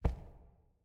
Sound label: Footsteps Ruins Cave; Main Character